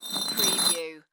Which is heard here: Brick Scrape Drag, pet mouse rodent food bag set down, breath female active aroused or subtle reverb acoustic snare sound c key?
Brick Scrape Drag